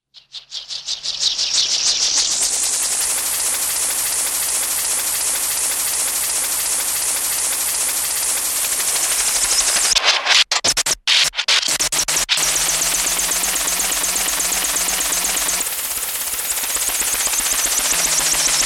Helicopter Blades Start & Steady Then Malfunction, Cartoon; Hollywood Edge; Cartoon Trax